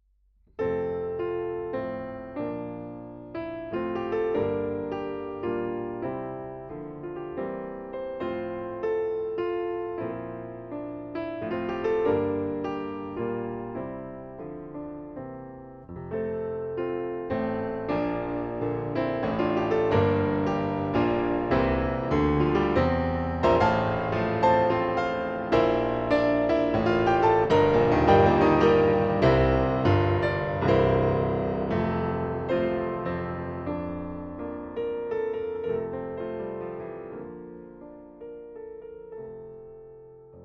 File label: piano; Bomblet